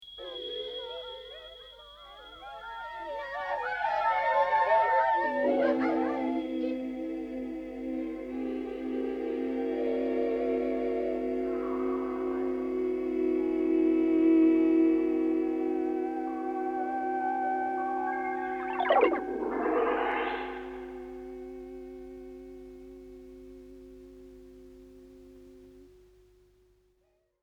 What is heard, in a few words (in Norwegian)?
Gesang